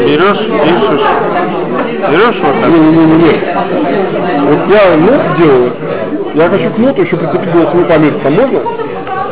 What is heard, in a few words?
noise